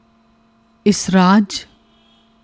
israj

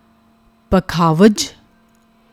pakhwaj, musical instruments

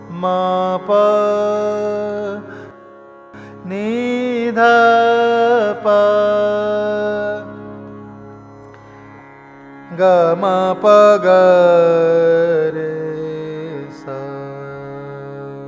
sur vistar, dhanasri

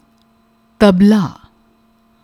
tabla, musical instruments

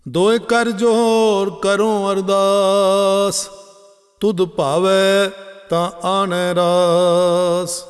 salok; ardas

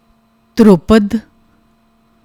dhurapad

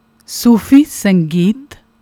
Sufi Sangeet, sufi music; Hindustani music tradition; Gurmat Sangeet

